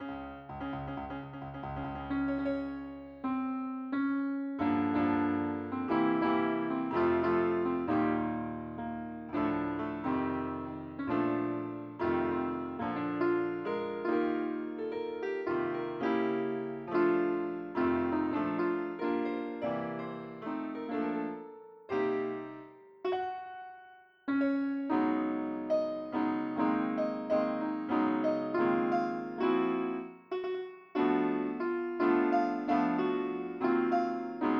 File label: piano